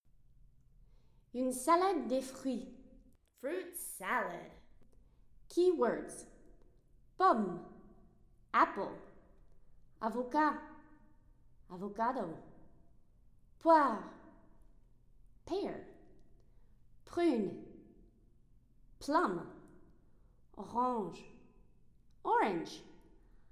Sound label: Fruit Salad French